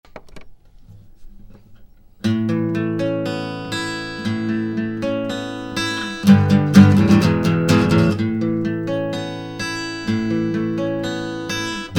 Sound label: rock tune